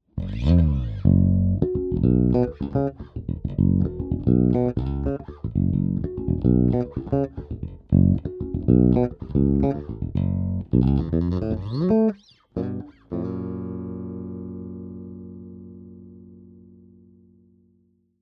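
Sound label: Finger style clean, guitar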